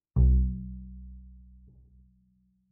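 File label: Bass pizzicato fortissimo sul E B2, Double Bass; MIS Pitches - 2014; Strings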